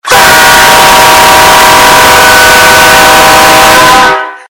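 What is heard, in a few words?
train horn bass boosted; gudok poezda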